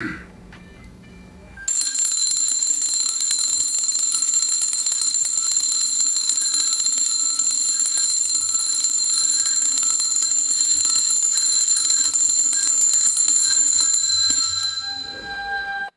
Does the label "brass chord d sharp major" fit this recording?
No